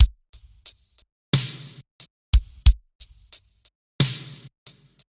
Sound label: 16 Beat E500 loop